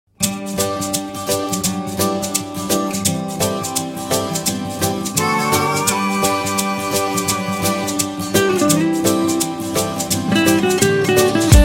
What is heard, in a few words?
Message Tone